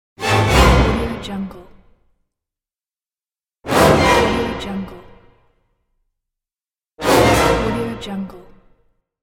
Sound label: horror strings jump scare